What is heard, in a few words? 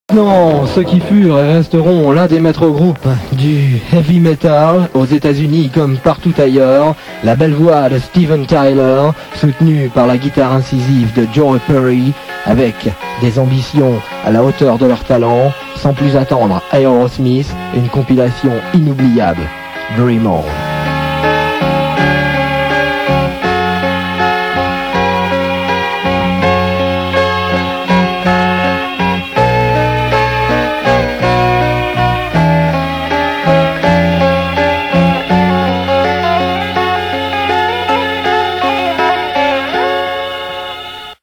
Riff